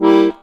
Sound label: HOHNER A, akkordeon; Best Service; Alpine Volksmusik